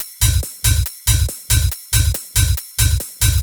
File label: Loop 140 BPM; vengeance essential club sounds-3